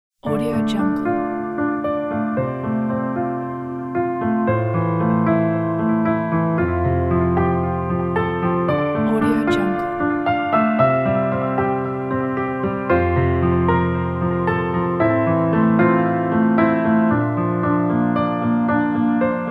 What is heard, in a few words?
love romantic letter